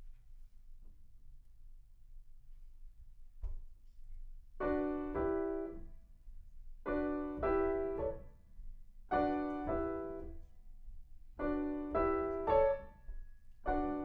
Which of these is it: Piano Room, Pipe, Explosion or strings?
Piano Room